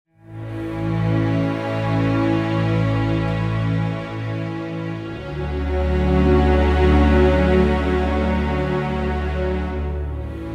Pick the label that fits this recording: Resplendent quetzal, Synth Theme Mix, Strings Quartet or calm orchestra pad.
calm orchestra pad